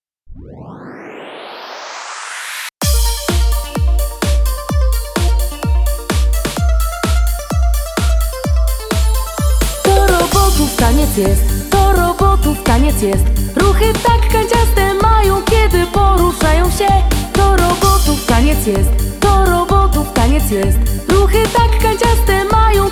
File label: POPCORN VOC